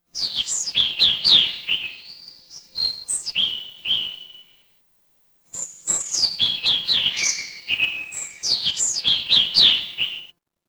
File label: ambience forest